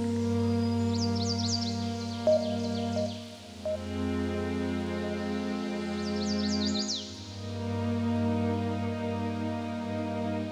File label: Chill Strings; Pads; BB 2HTC Samples Vol 4